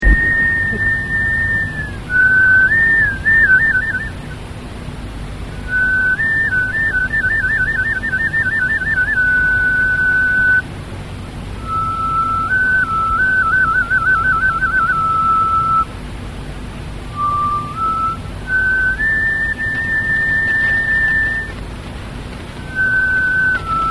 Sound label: flutes, chine